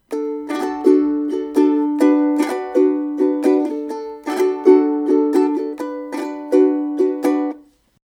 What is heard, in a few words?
Strum, ukulele